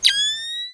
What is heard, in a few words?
Night Vision on